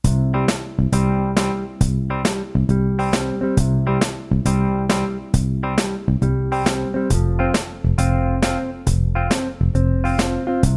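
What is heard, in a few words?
Country Shuffle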